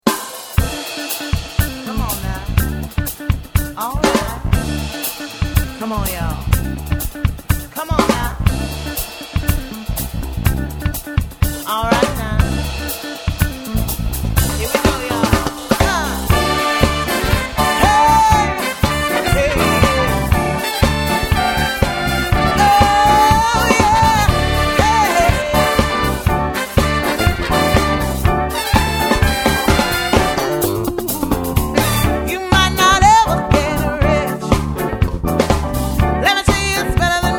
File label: Car Wash